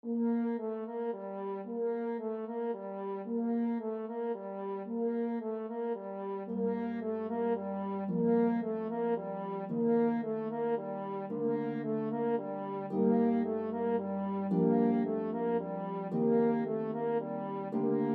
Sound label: French Horn and Pedal Harp